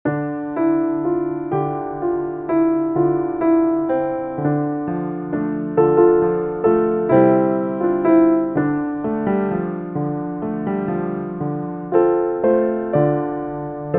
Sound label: scarborough hautbois